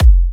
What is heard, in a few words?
Kicks F; markus hakala